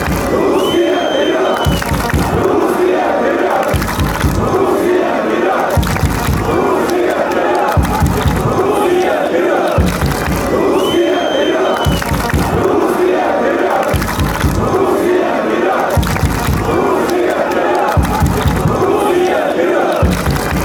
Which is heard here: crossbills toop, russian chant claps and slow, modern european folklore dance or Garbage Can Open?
russian chant claps and slow